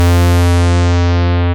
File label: Bass Long F; VEC1; Club; Long Basses